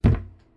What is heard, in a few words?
Hit On Wood